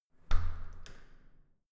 entrance door close soft

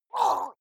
water monster female gargle groan pain short; koerpergeraeusche; comedy-cartoon; soundpool

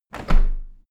window handle close hard; objekte; fenster

